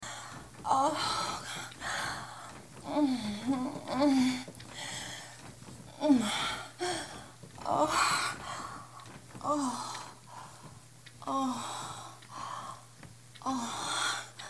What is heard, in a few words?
moan